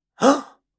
man gasp

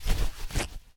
brutepack end; Medical; Items

